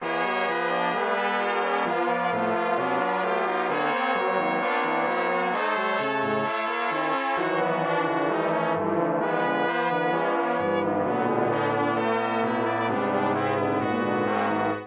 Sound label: randomly generated music